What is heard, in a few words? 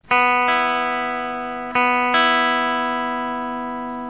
ear tune